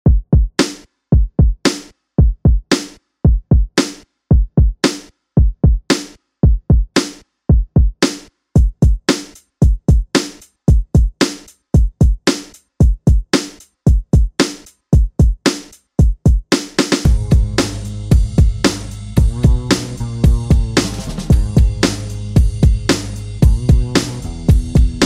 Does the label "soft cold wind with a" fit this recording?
No